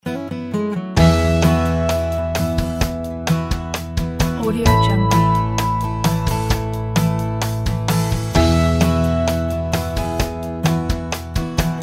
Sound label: acoustic country folk music